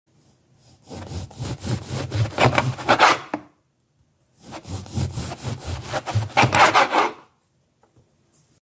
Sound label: Cutting Bread